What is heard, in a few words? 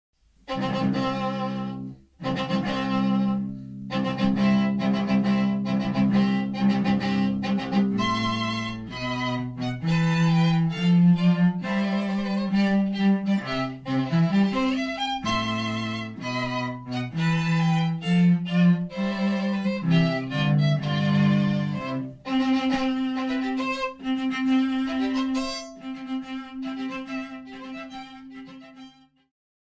Viol Cello; Mendelsshon